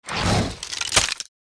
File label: Pet Yeti Die